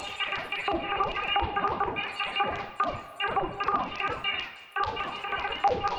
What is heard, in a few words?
Tonal Re Draw, sparse soundscape